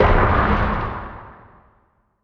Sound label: asteroid damage interstellar big; Factorio